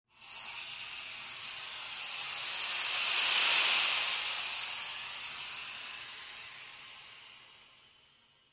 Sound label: Air By Slow Close